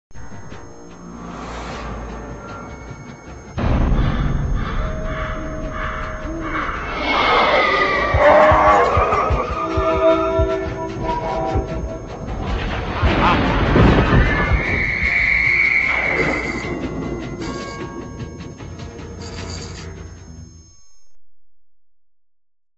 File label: jingle; cinefx; extreme fx